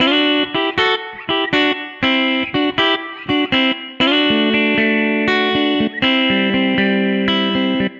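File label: Guitar Retro D major 120 bpm; Guitar and Bass; Ableton; Factory Packs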